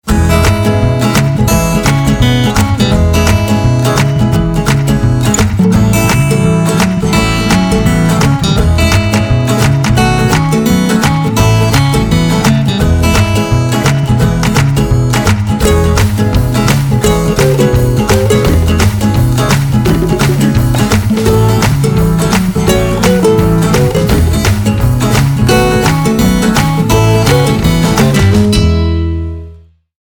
Maori Strum

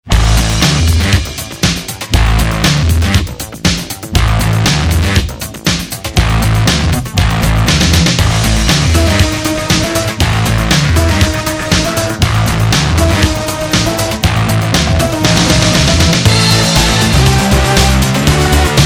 DISTORTED BASS

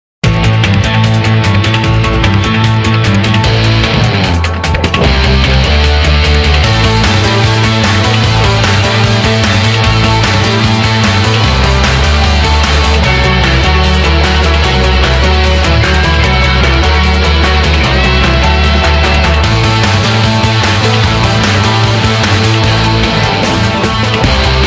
LIVE BASS

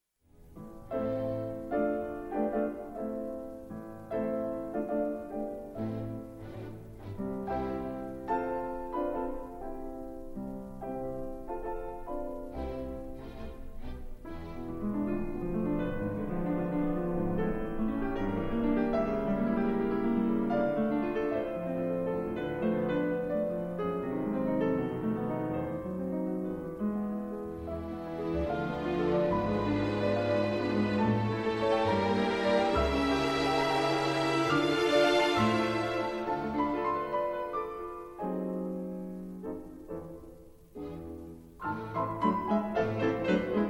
PIANO CONCERTO 1ST MVT OPENING

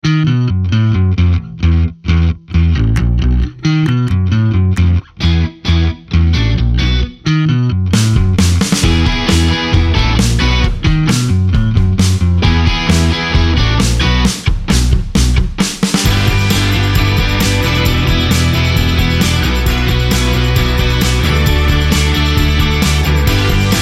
BASS JUMPER

